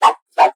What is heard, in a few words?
knife; ragemap2023